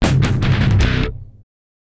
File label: fanfare